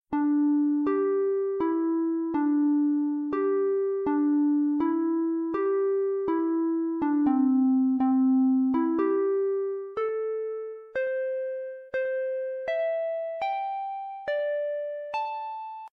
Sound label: piano tune